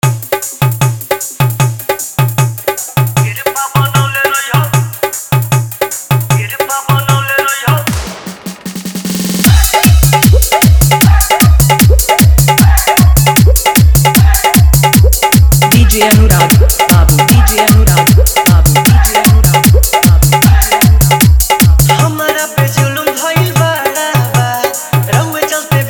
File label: Hard Dhollki Mix